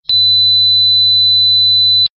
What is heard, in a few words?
High pitch mosquito